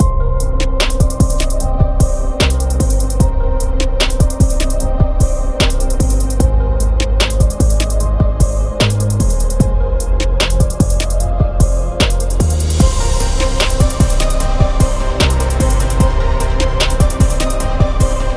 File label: Dramatic Hip Hop